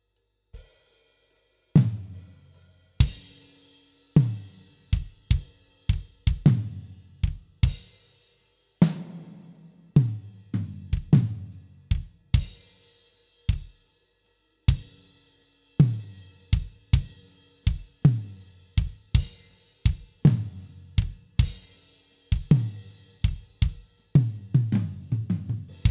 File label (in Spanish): Drum Sin Gate; compuertas gate de audio